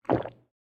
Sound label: gulp; soundscrate